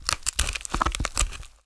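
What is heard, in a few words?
skeleton ambient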